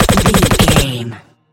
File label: gun semi auto shot silenced